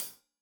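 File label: hat closed, hi-hat cymbals stereo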